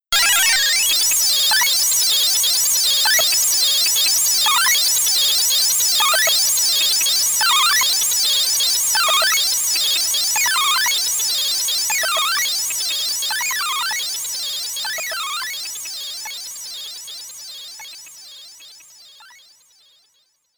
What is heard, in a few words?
Creature BGM; Make Trax; Arcade